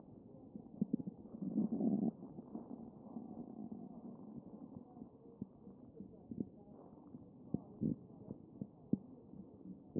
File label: A CHEST